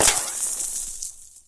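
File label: ricochet; mistake coop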